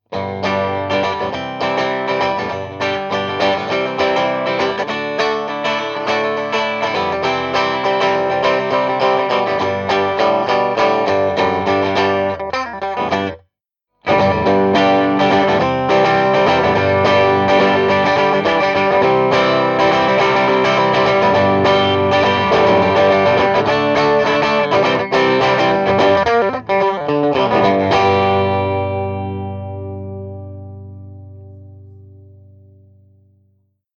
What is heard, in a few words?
Samples Rickie Clean Crunch; Sir Charles